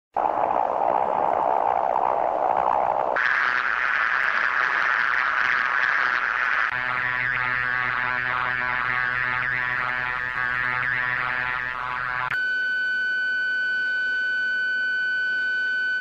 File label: missile lock; aim-9